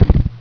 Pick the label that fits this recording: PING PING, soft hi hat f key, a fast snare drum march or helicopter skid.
helicopter skid